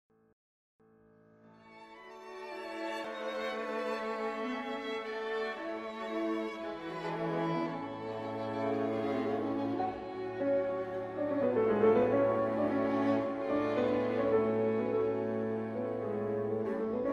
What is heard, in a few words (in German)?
Klavierquintett; Minguet